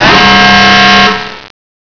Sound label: warn, ambience